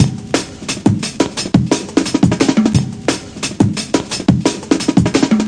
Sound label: happy round break, drum loops and breaks, 175 bpm; 161 to 180 bpm